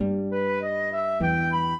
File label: minuet, flute harp; Mozart